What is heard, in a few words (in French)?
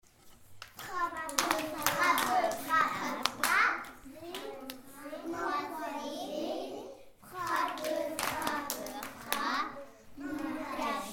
Frappe frappe frappe